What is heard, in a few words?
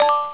receive